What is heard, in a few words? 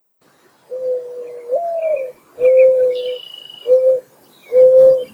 Mourning Dove Coo